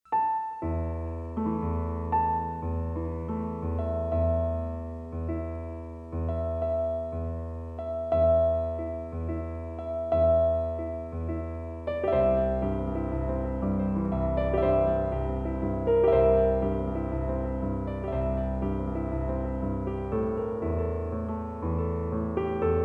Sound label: Piano